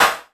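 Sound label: CUTED snare drum, KICK + SNARE; Best Service Hyper Dance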